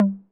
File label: Tom Hi 808 Saturated B